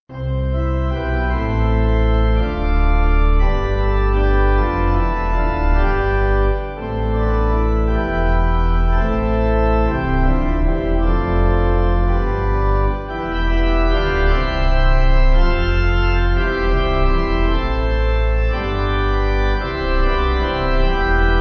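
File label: Pipe, pipe organ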